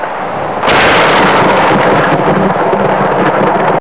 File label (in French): tonnerre; Orage